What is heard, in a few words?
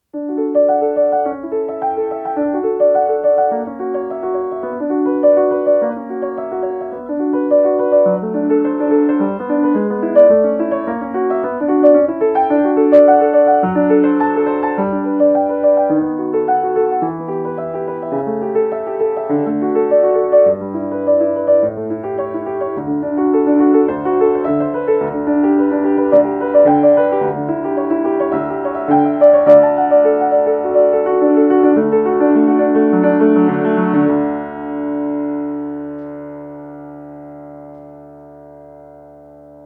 Solfeggio in D; Bach